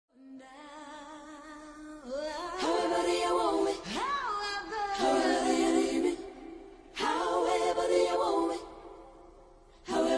acapella

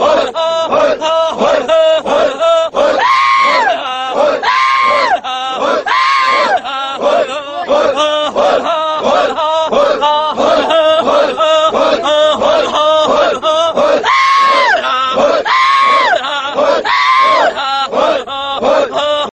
funny arabic song

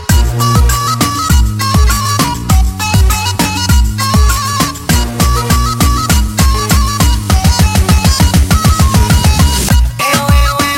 Beat

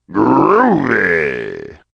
Groovy

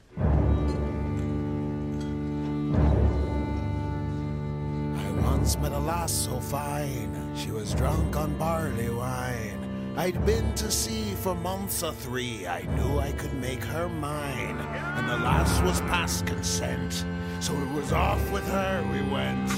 Pirate Chantey

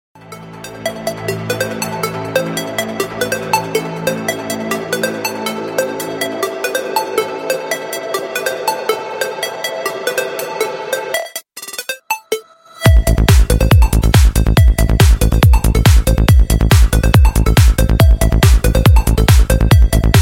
trance, ringtones; electronic

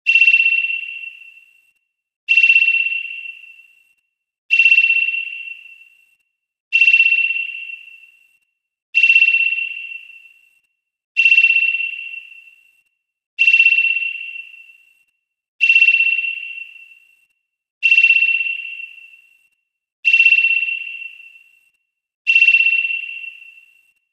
alert sonar; ringtones; sms

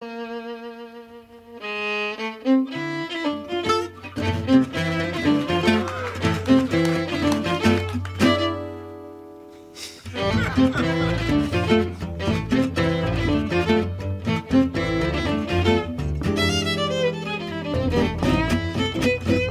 Gypsy

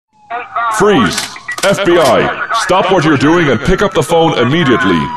sms

